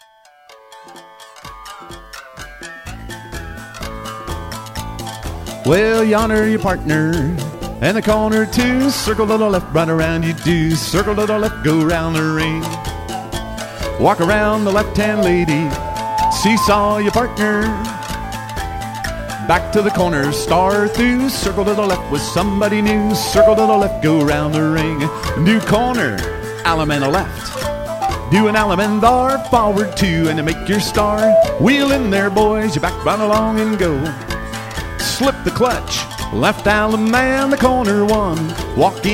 Patter

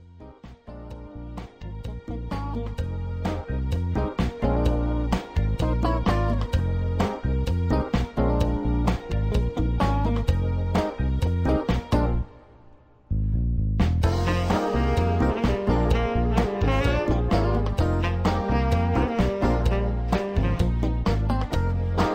Patter

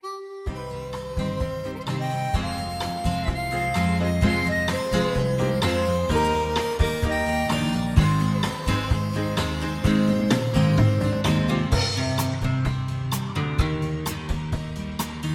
Instrumental low key

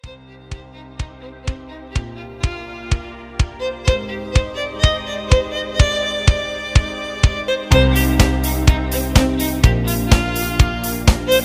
Fiddler Patter

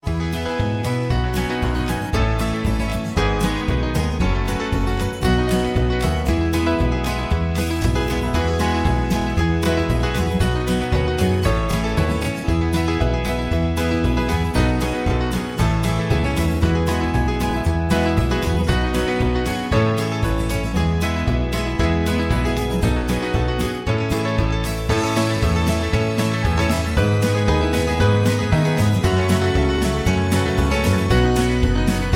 Outbound Plane High Key

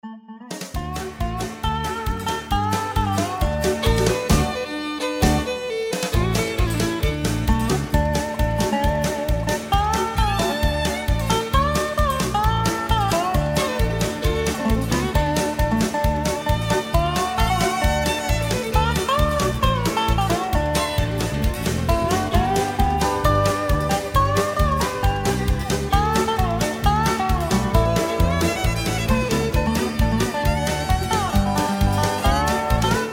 Instrumental

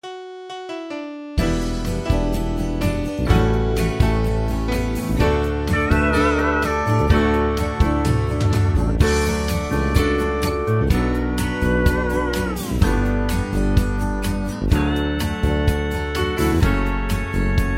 without melody

